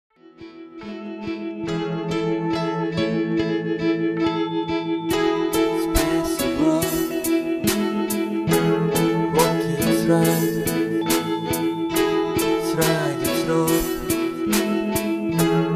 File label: snowloop